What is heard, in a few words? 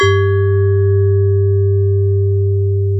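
TONE G1, vibraphone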